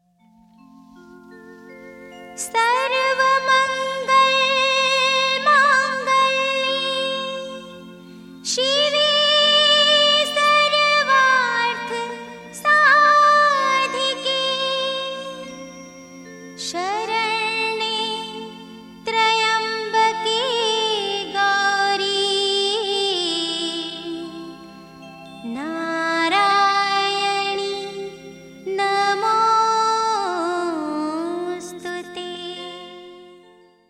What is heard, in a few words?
Durga Stuti; Rajasthani